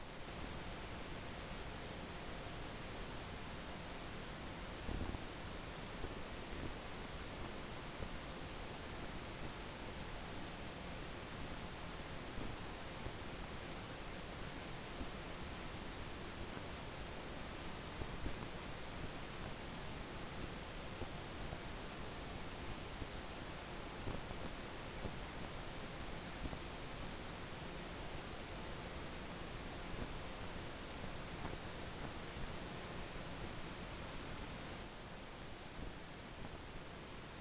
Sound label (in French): lé moteur (bruit, fréquences); Fablab